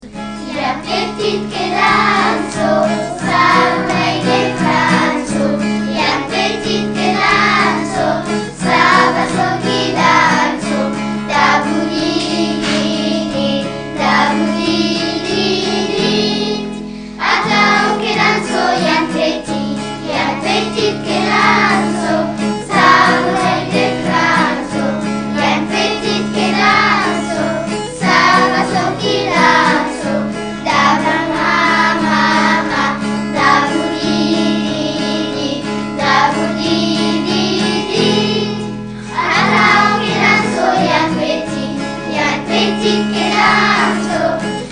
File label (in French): Jan Petit enfants Bearn